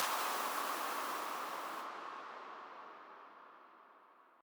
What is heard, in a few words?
impact